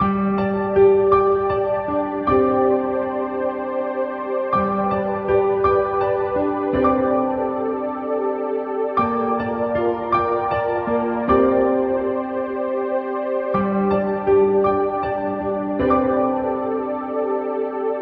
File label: piano, Loops, Sad